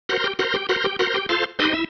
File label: Single Riff, Organ; Sonic Foundry (Sony Creative Software); Joe Vitale Organ Donor